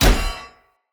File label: Sphere Polearm Medium Impact Armour Solid; Weapon Impacts; Player; Combat